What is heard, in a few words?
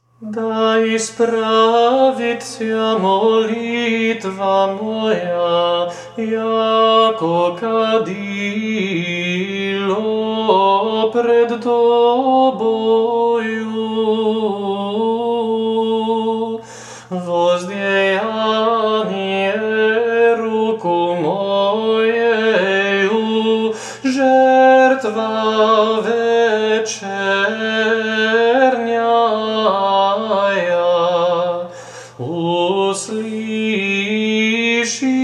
Da Ispravitsja drugi glas, Serbian chant